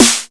sparkly snare drum sample a sharp key, steel snare drum tuned to the A# note